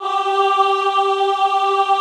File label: AHH G3, Extended Classical Choir; Best Service